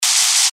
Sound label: future electric arcing and swoshes